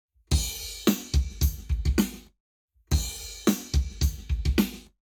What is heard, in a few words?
Drums, 0to360deg; Lab Wet